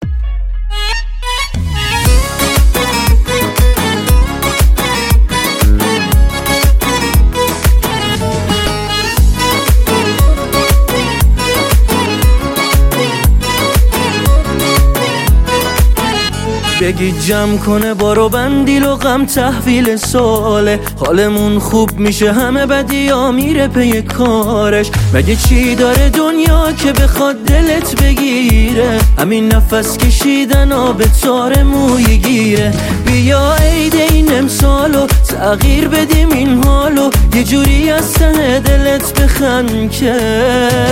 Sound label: noroz remix